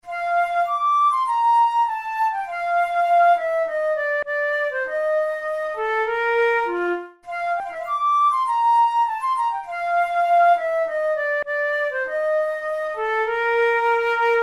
studies for the boehm flute; drouet 72

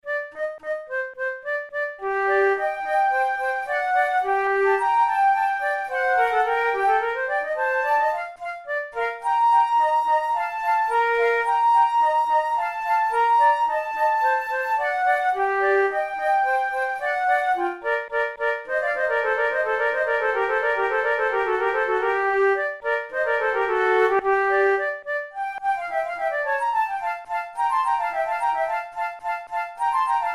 allegro, flute tunes; loeillet de gant op5 no10 iv

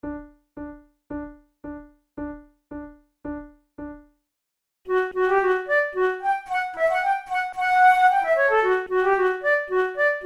duet, flute

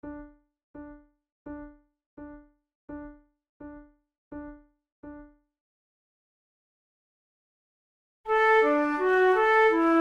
duet, flute

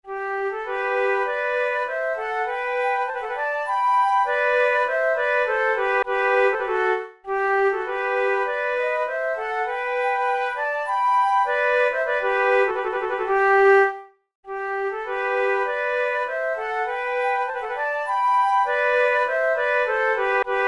duet

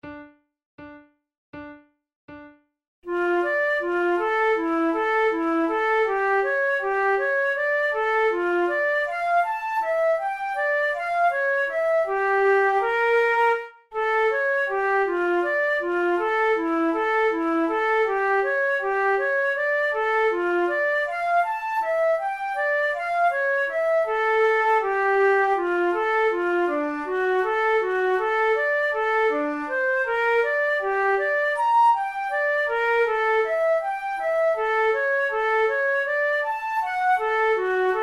duet in d minor, flute; kohler op93 no1 duet no3

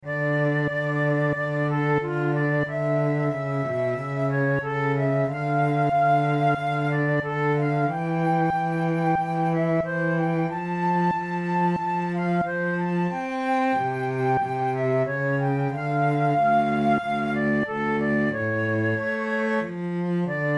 adagio, flute

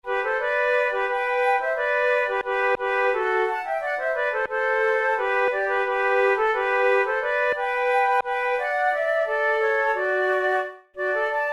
duet

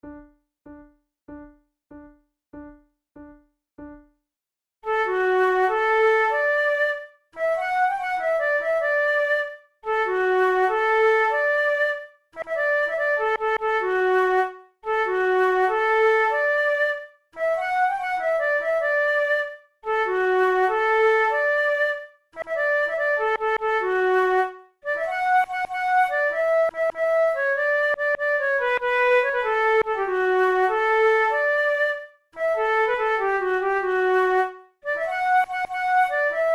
duet, flute

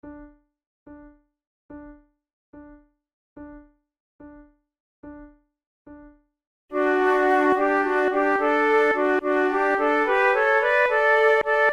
trio, flute

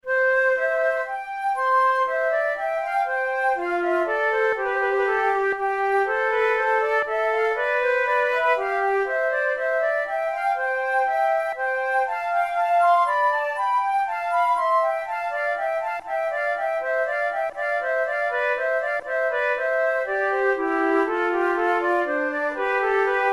prelude, flute; boismortier op 22 no35

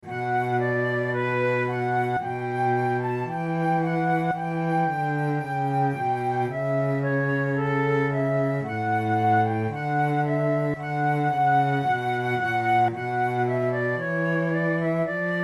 adagio, flute

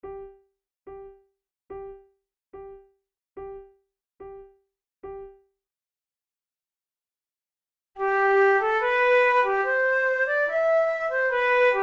duet

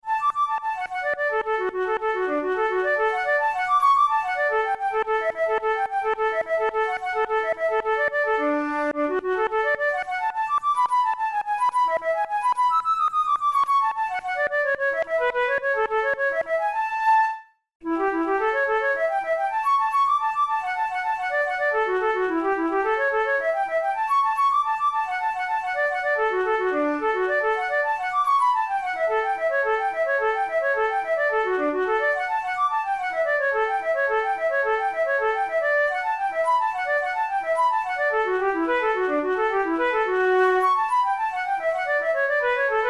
methode pour la flute study; drouet

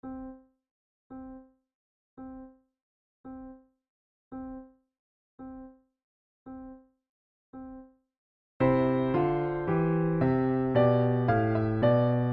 sonata in c major adagio, flute tunes; loeillet de gant op3 no1 i

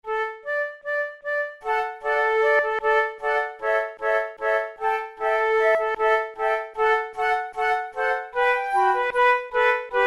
boieldieu the white lady trio, flute

